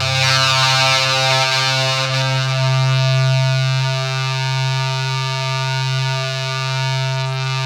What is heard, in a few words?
ZWEEP; Spectrasonic Distorted Reality 1